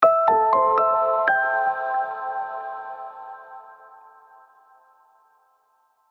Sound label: jingle